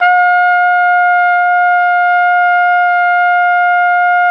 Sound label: Flugelhorn; Roland L-CDX-03 Disk 2